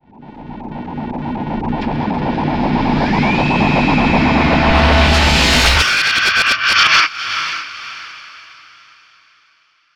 Tension Builder Impact

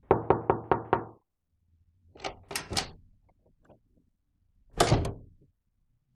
Door Knock; Household

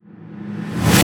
Riser

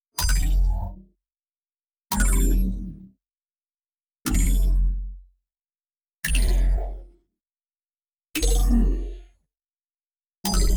Ultra Electro Rising Ding

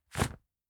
Pokes Into The Sleeve, Clothes

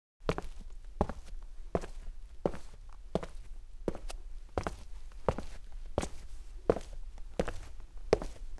Cement Sneakers, Footsteps

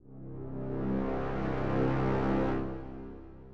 Dramatic Brass

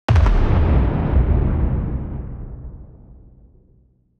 Explosion Tactical Weapon

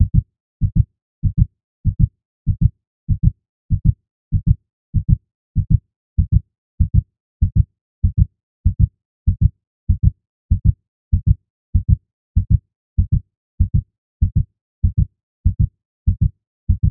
Tension Builder

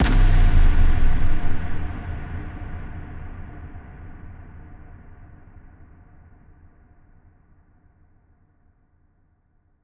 Impact Thud Splash

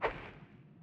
Footstep

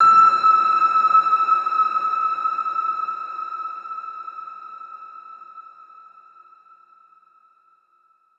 Piano Slam

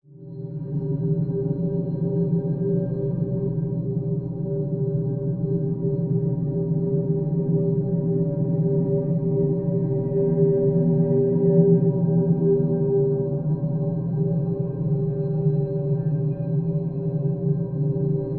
Drone; Drones

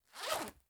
Zippler, Clothes

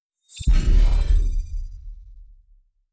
Robo Body Electro Swirl

